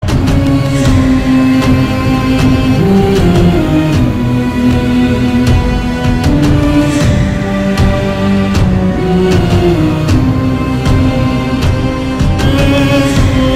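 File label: Motion Poster background music; Megastar Chiranjeevi; Acharya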